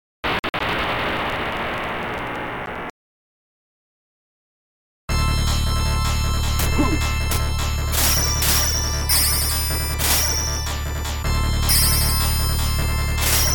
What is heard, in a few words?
sword